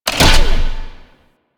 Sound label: Shell Pillar Spin Stop; Warframe; Corpus Railjack; Comet Shredder